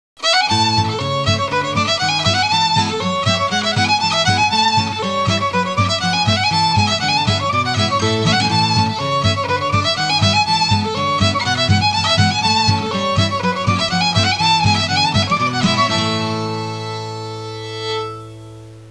suonerie musica traditional usa country; american

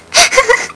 GIGGLE

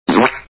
Zip Fart, cartoons

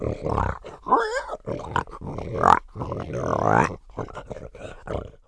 maiale pig; maiali

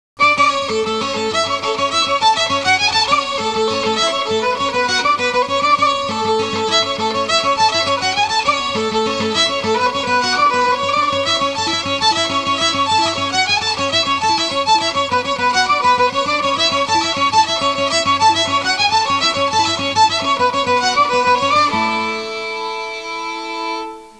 suonerie musica traditional usa country; american

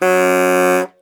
HORN, cartoons

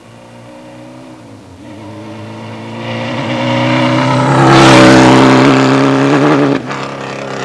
MOTORCYC; trasporti

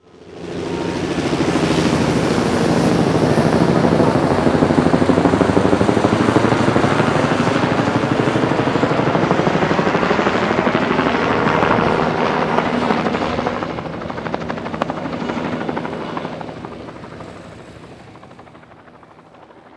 ARMY HELE, elicotteri; trasporti

